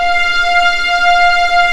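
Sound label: F5A legato, violins; East West; The Ultimate String Collection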